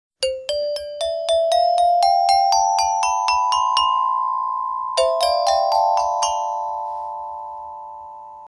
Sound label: CLASSIC METALLO NOTES